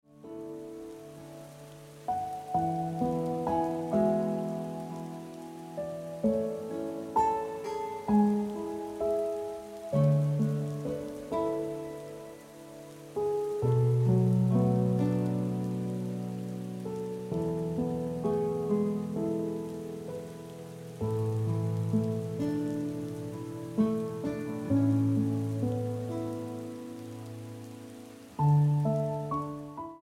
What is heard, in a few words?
Ringtone